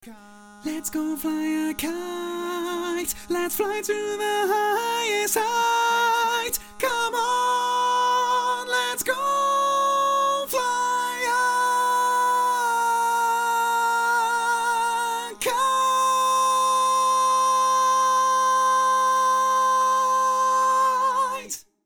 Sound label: Tenor, barbershop tags